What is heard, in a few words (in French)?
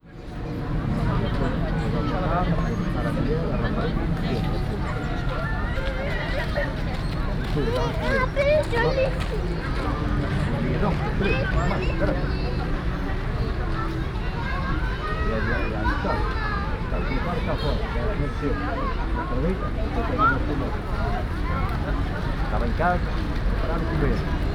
square batignolles, après-midi